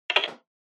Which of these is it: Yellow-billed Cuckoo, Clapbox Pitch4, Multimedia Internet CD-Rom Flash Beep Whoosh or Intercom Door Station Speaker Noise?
Intercom Door Station Speaker Noise